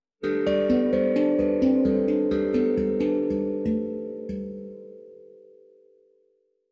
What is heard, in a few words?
Sting